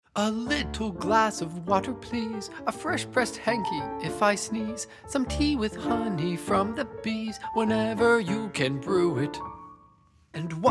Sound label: Piano; My Little Pony Friendship is Magic